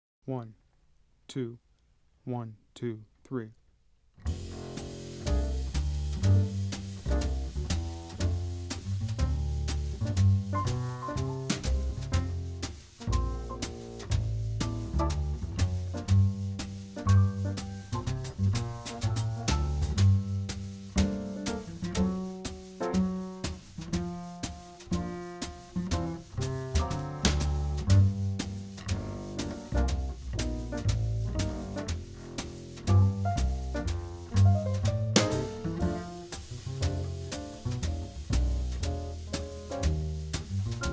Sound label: So Long Birdie rhythm only